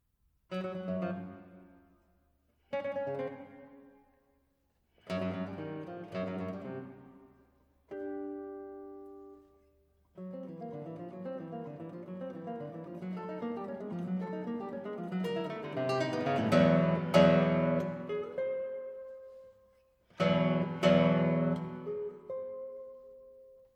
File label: guitar